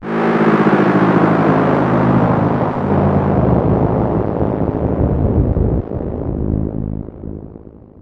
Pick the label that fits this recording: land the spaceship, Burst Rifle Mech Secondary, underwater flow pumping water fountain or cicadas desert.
land the spaceship